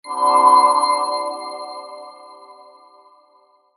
光魔法 大 モノラル

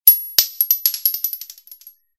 薬莢 モノラル

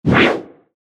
剣 空振り; 武器効果音

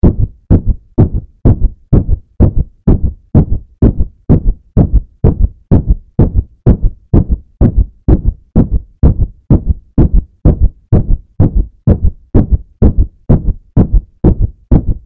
鼓動

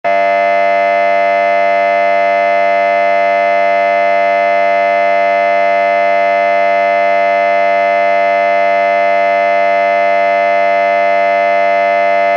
劇場やホールの開演ブザー リバーブ有り; 戏剧音效

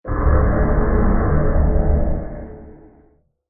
重力魔法 大; 魔法効果音; RPGなどに使える効果音です

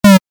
ピウ レトロ モノラル

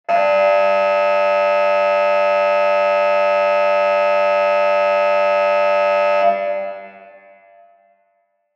劇場やホールの開演ブザー 長め リバーブ有り; 劇場やホールの開演ブザーその２; 演劇・舞台

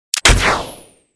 クロスボウ発射

